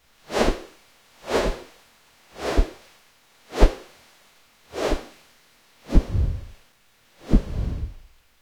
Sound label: cloth rustle light